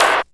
STATIC; Back In Time Records - Big Bang

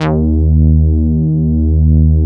Moog Taurus, EB2; Big Fish Audio - First Call Vintage Keyboards

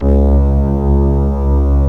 C#, strings; Big Fish Audio

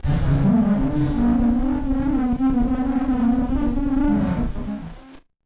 Battered Synth; malfunctioning synth samples

